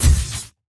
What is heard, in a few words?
Sci-Fi Weapons-Source Sounds-Futuristic Sci-Fi Shot